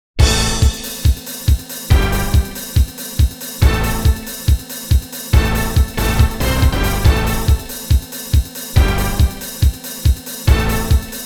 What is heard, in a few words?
jingle